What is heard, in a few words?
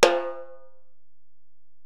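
Acoustic Snare, Alesis DM-PRO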